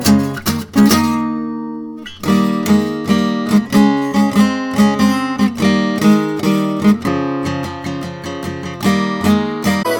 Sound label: Fanfare